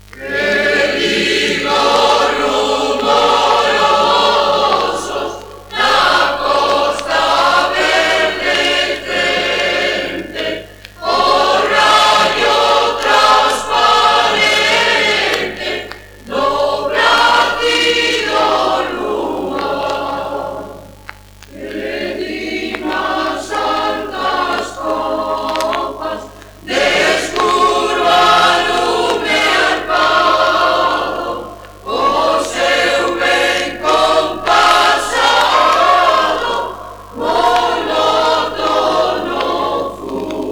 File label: Himno gallego